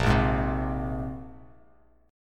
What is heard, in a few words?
A sharp 7th Suspended 2nd, Bass Standard; strummed; JGuitar